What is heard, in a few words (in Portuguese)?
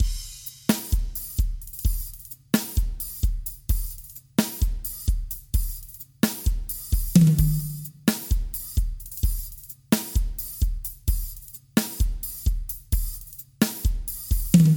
RITMO